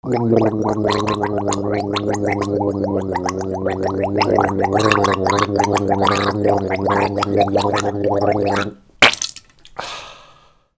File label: BATHROOM